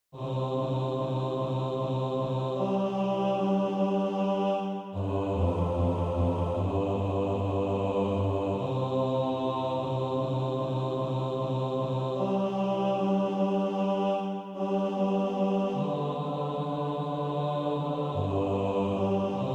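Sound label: bass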